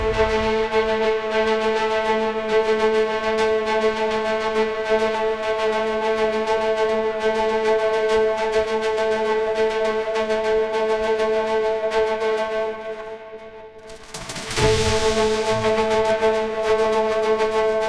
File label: ringtone cinematic